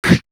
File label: Beatbox; 90 sSampleCDs; Coldcut's Kleptomania VOL1